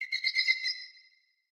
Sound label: land bird; smite; chaac